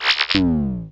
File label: Minimize; Unreal